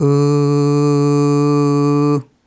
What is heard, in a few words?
Ooh Short D2 Dry; Harmonic Building Blocks